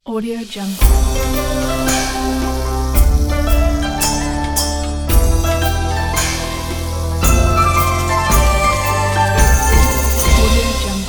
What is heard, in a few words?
asian logo pack